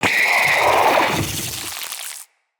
Spider Elite Vocal Attack; Root Spider Elite

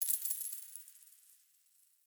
Coin Drop; Warframe; Ambience; Nineteen Ninety Nine

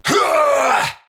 Attack Effort, Battle; en; Tau; Tau Dax Knight

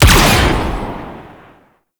Blastor Pistol Shot

